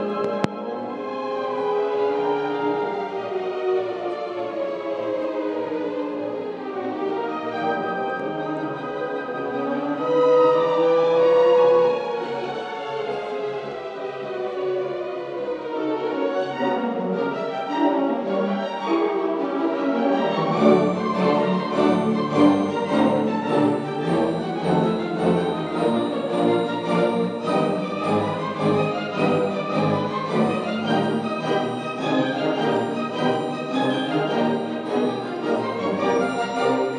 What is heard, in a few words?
cartoon music